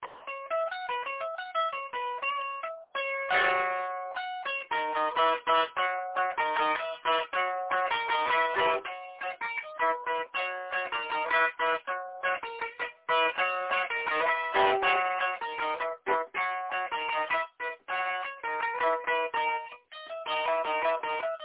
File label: video game guitar